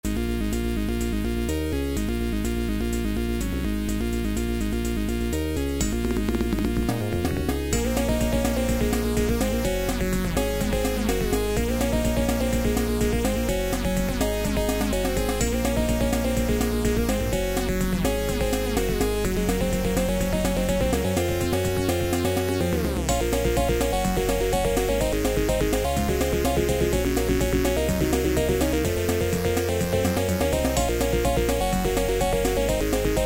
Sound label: turbo sound fm cover; topgun2 level2